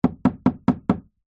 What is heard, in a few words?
impacts wood hollow wall hit x5 fast